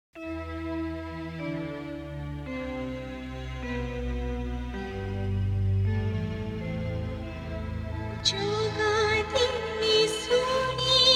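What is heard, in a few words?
sad, Odia Song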